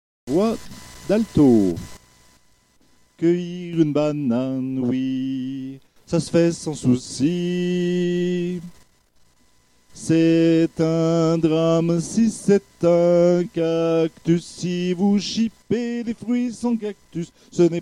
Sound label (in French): Altos